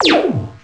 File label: synth laser; Earth and Beyond; EA Games